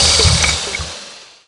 berserk potion cast; weapons; dota; alchemist

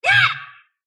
sylph attack short; dota; dark willow

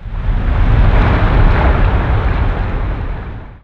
cast flames; weapons; dota; nevermore

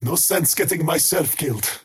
crown teleport pain; skywrath mage

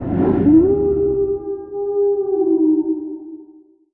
howl distant; weapons; dota; lycan

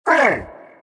abys pain; abyssal underlord

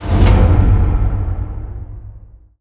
map open, ui menu

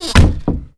coffin burst; ambient; dota; diretide

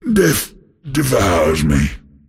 death; dota; pudge

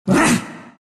broo pain; broodmother

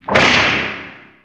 dream coil snap; weapons; dota; puck

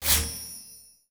learn popup, ui; dota

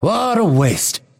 deny pain; dota; phantom assassin

